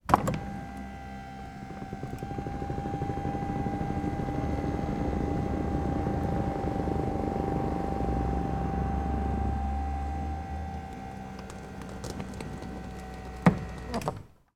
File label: chair electric recliner leather operating recorded underneath